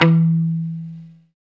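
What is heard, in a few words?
guitar mute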